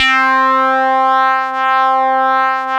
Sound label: 700 B-SAW C 3, Korg; Back In Time Records; Korg Universe VOL-1